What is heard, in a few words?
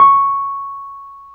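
Wurly C#7, piano; Keyboards of The 60's and 70's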